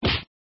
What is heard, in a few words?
struck